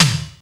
snare drum; Crypton - DrumBase5000; 52 SNARES

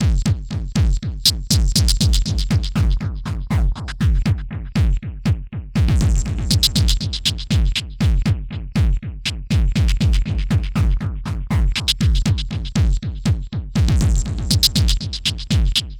Structured Rhythm, IDM Rhythms

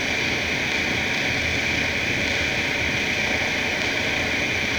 Cracklature, sparse soundscape

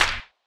CP Clapernicus Clap, DRUMS; Polyend; Legowelt

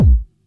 Kick, retro house samples, Drum Hits

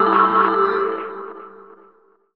DR. LOOP; X-Static Goldmine 3

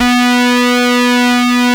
OSCAR B3, Synthesizer; Hollywood Edge - Giorgio Moroder Rare Synthesizer Collection

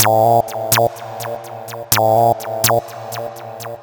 Rambling Seqs, Synths; Sonic Foundry (Sony Creative Software); Underground UK House & Electro

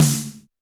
Snare, Roland TD-20

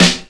SNARE, DRUMS; USB Soundscan vol.02; Underground Hip Hop; AKAI

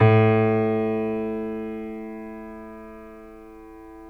PNO Grand Pno; Club-50 - Foundations Roland

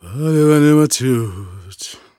Vocal Chirp, African Voices; Quazibeat; African Secret

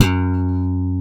Alembic Pop F#2; Mo' Phatt; Digital Sound Factory Mo' Phatt

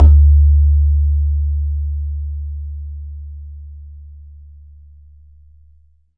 BAYAN, Drums Percussion

